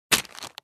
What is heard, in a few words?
Small garbage bag objects throwing away dumpster; household